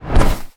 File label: Hugging